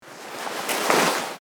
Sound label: Leather manipulation movement noise